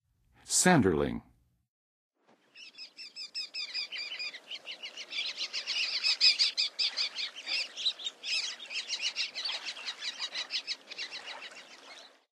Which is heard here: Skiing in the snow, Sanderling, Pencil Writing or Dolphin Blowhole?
Sanderling